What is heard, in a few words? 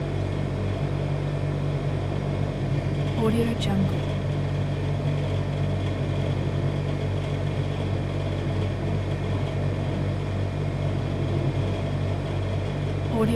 household fridge old compressor run loop; blazznet